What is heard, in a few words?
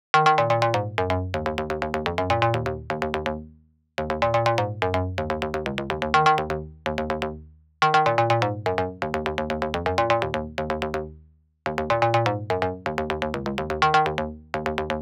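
Bass Hi, 125, D minor, Dry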